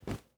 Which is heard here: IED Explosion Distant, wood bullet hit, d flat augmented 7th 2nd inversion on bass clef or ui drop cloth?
ui drop cloth